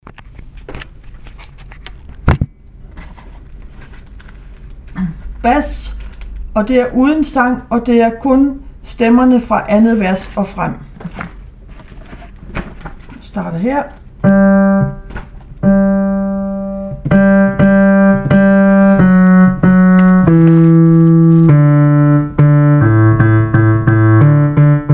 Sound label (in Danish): bas uden sang; vi finder fred i kirken